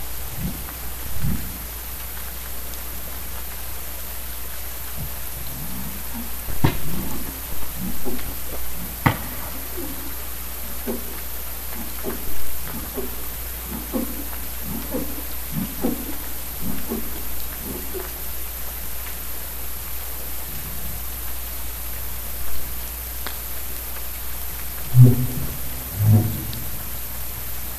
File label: Humpback whale flipper slap vocals